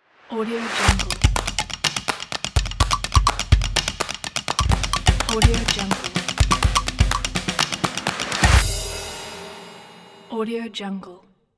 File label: percussion claps snare logo